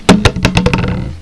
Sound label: drop; HOUSE